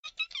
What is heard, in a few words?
rabbit